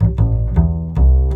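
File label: bass loop, 150 BPM, drum & bass; Ueberschall; Drum & Bass Flash Trackz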